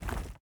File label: Walk; portal quest android